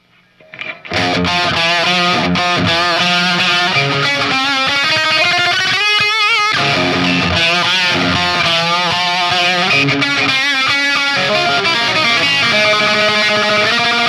solo; metroamp

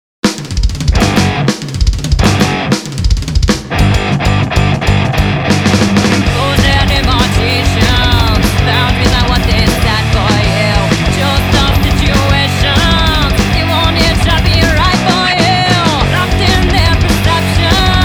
vh4

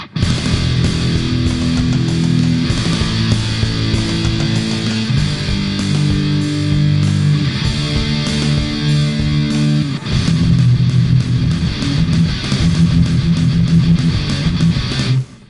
quad marshall combo

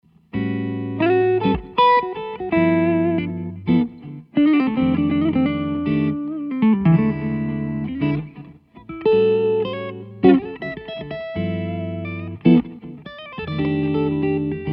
vh4 clean blues

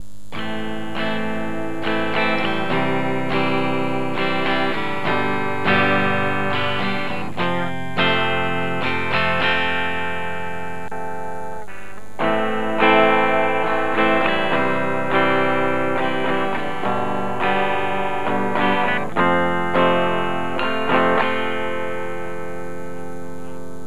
clean dist session; renegade 65 tone stack

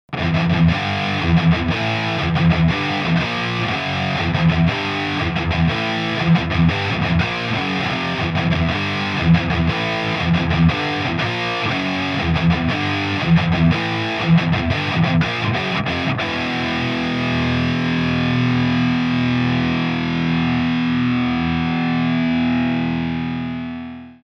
vh4 no bbe treb eq

